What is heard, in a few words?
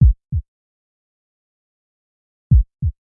Sting Heartbeat